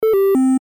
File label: Chat; danielTheBard